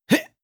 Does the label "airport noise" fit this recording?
No